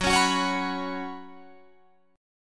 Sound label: F sharp Suspended 4th, Guitar, Drop D